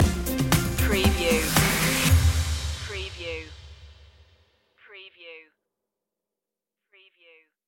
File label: time with you stinger